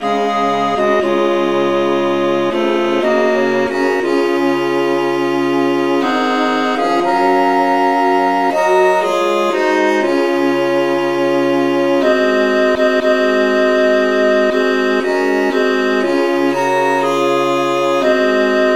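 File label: traditional key F sharp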